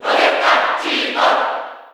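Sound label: Cheer Japanese; Rosetta; SSB4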